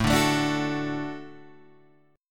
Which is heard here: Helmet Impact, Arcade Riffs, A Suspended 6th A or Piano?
A Suspended 6th A